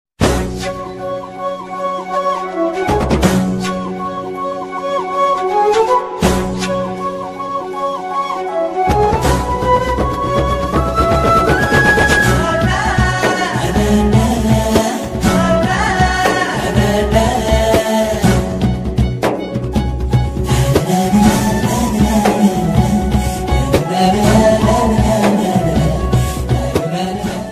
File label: Aadukalam Bgm Ringtone Tamil Bgm Ringtone